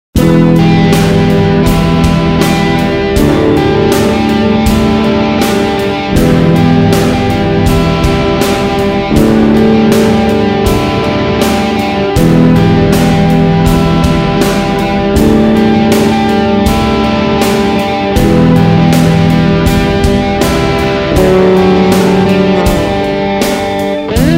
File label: jazz bass